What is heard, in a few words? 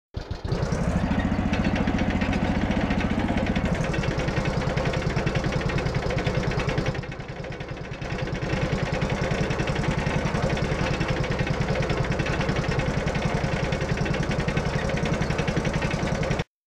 moving